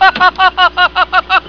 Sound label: HAHA; (Goofy laugh); Neighbors